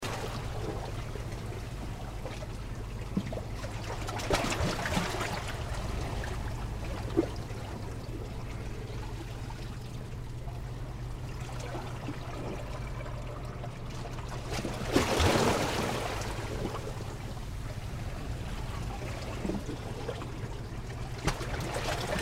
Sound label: Water under pier and rocks